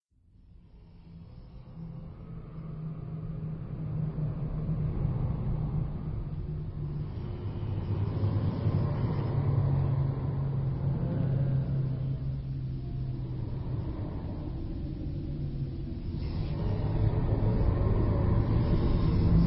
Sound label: zombie ambient; Zomboid 41.78.16; Project Zomboid